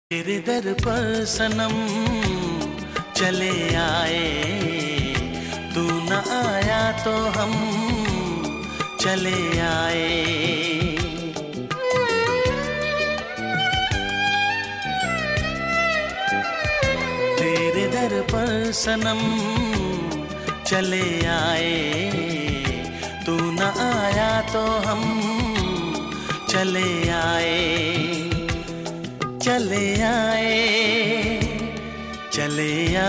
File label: Ringtone